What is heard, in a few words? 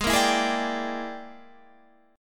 E 7th Sharp 9th Flat 5th G sharp, chord